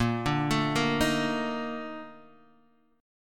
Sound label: A sharp Major 11th A sharp Guitar Half Step Down